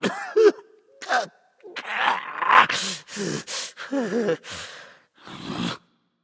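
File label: non-verbal boohoo; smite; voicepack; jingwei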